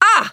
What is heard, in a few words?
Wound; Battlerite; Engineer